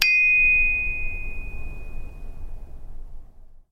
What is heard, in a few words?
chinese bronze bell side; sackler